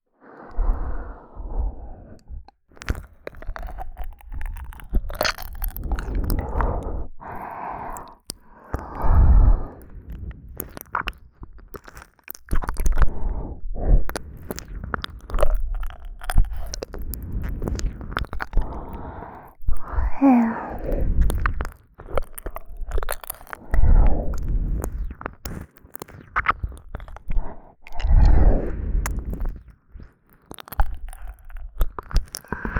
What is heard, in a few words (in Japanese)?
れろ…れぇろ…（奥深くを舐める）, ASMR, 右耳